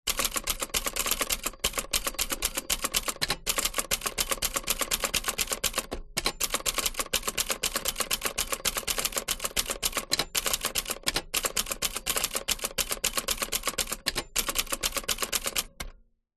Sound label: office typewriter typing medium rear close vintage 1960 Lemair Helvetia